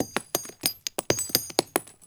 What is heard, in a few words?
mg42 multi shell; WAR